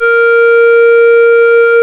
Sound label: Synclav FM, WIND, A#; Big Fish Audio